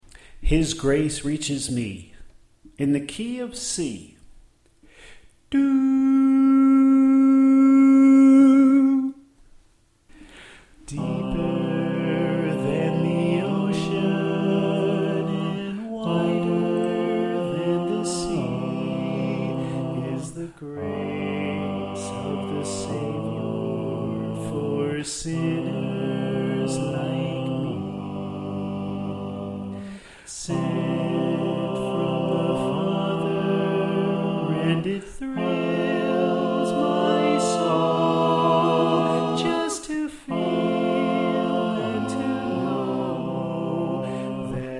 Alto Left

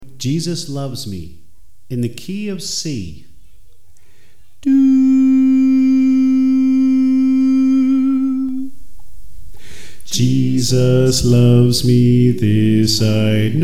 Bass

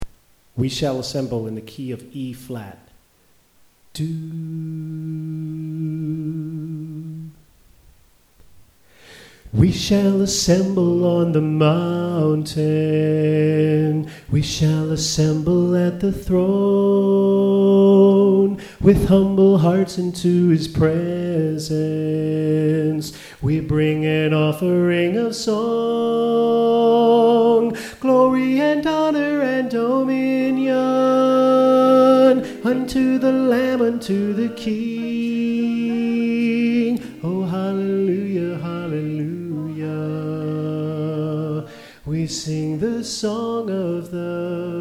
Soprano

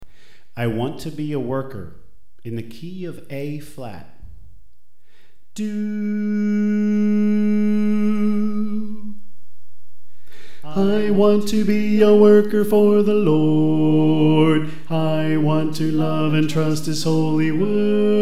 Bass

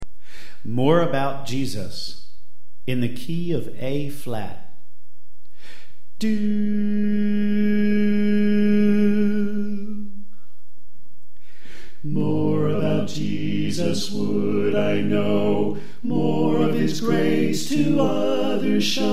Alto Left

